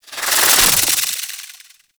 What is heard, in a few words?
Slinky Movement Rattle; Warframe; Man In The Wall